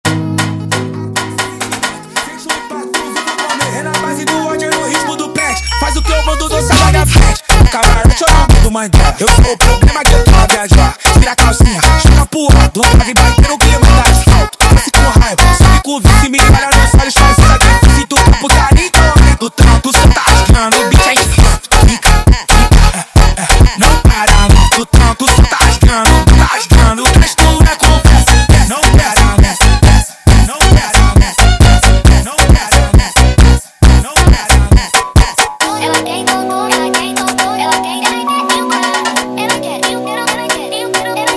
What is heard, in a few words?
Funk sped Up